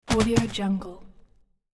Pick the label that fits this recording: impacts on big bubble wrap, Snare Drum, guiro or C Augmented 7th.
impacts on big bubble wrap